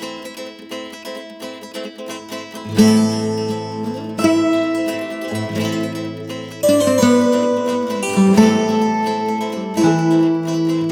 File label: Music Berserk String; 7 Mages